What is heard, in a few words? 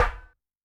Shotgun, Snare; Yamaha DTXpress-II